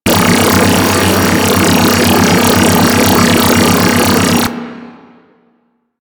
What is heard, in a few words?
Space Trench Run Energy Gate Loop B; Warframe; Ambience; Space Battles